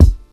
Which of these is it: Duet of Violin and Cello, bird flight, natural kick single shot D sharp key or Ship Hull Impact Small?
natural kick single shot D sharp key